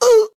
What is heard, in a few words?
scream